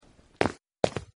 step; Legends of the Hidden Temple - Unlock the Past

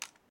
land; xlr8; Ben 10 - Hero Time Game

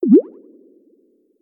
anchor bubble; Crash the Bash - Drink Break

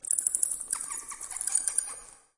bats; Be Cool Scooby-Doo! - It's Dark Out There; ThrillGames

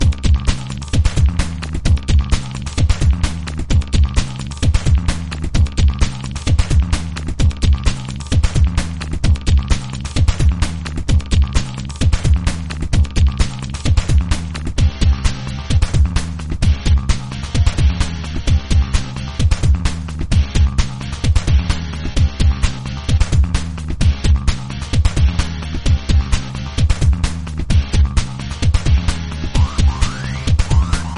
music in a heartbeat; Gotham City Speed

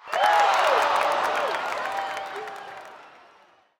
crowd; Nick Summer Sports Stars

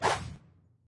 move; The Powerpuff Girls - Trail Blazer; NextlevelGames

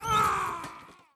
fail; Boss Baby - Matching Pairs

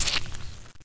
splat; Zombie Smasher

